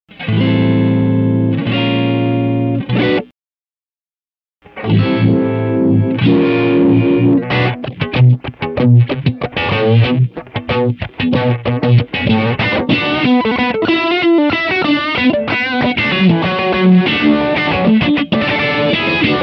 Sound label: vibe, mobius